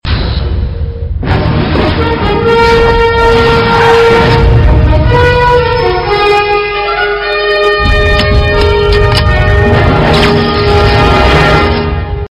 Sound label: Intro Bgm; Chitti